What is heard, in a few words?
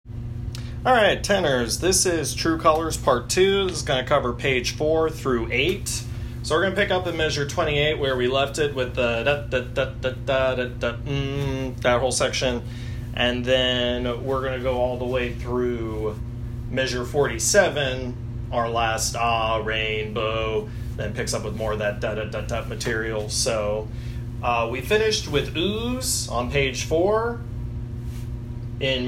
Tenor